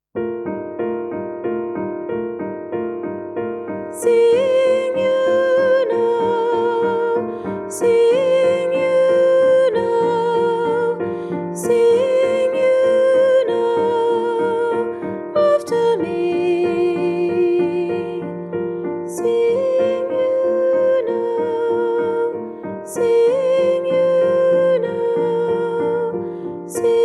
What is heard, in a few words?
sing you now sop1 piano